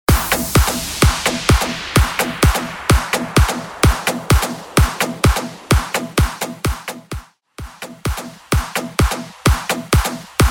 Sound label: House Mashup 128BPM; edm mashup